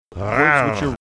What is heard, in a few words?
making sexy growling sound